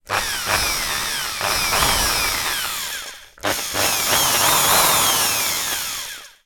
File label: industrial drill short bursts testing